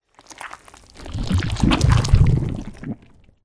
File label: insertion